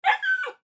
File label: Dog Akita Puppy, Dog Akita Puppy Whine Single Yelp; Animal; Dog Sound Effects